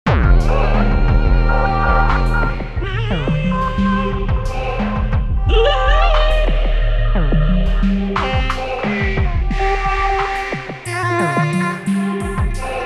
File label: Sequential Prophet X Vox Zone